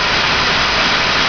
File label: smoke g, weapons